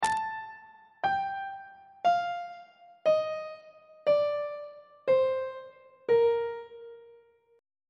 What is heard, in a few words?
a sharp major scale descending on treble clef